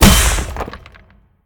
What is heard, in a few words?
pwr hit stun object; smite; gilgamesh